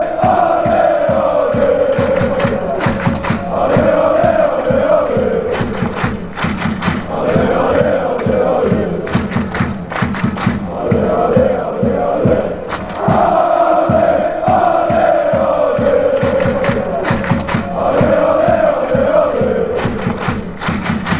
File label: slovenia chant